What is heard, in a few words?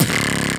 Mouth Bass; Aawaz; Gully Loops